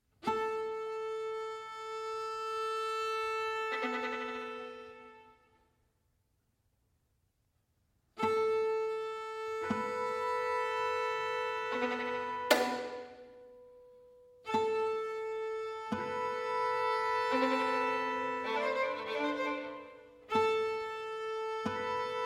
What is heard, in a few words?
quintet for high strings